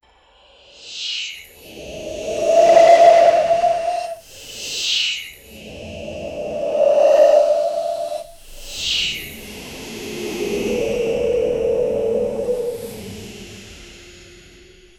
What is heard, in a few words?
a dark place a boy, dream